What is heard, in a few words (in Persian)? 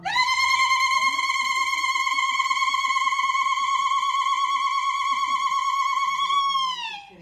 صدای کل کشیدن; ceremony; wedding